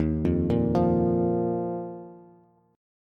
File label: Eb Suspended 2nd, Bass; Ebsus2 arpeggiated; JGuitar